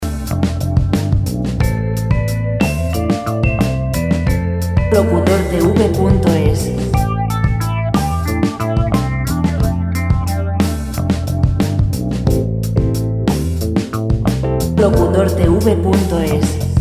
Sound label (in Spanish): 95 lent; relajante musica libre